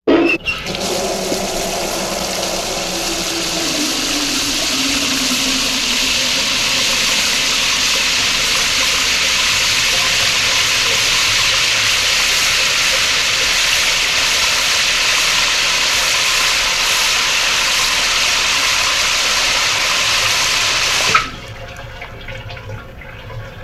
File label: household stall shower; sound effects one